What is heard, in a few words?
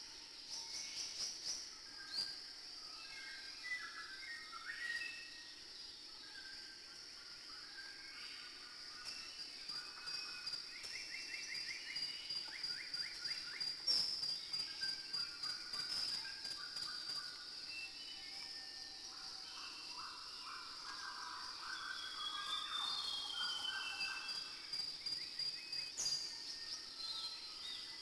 Jungle Bed Lp; Ambient; Civ4